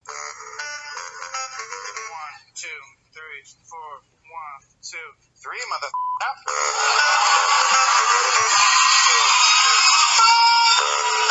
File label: Sax Ringtone